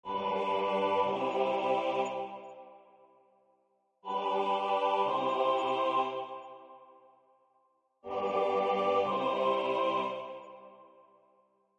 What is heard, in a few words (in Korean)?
주3화음 전체